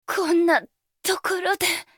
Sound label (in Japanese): 死亡; ナタリー